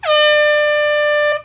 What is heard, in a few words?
office horn short; The Office: Horn (short)